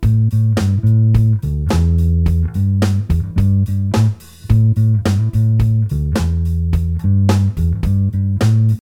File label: Blumes Clean Sound; Earthquaker Devices